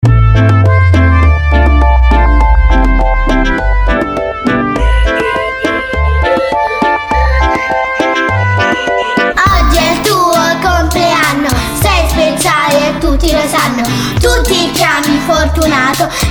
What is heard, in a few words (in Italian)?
CANZONE COMPLEANNO; CANZONE DI COMPLEANNO!!!